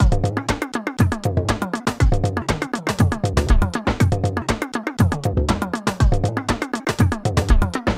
Temple Dojo E - Ethnova

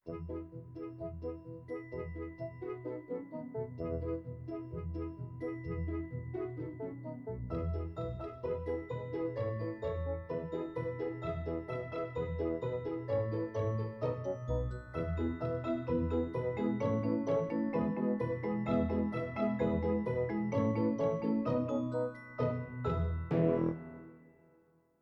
Christmas Special Music; peppa pig world adventures

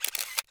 hud photo shot

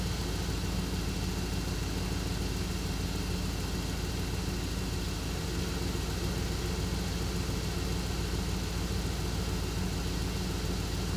vehicle medium idle; teardown; ps5 windows xbox series xs

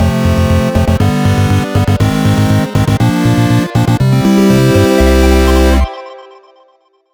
Game Win; Miles and Kilo

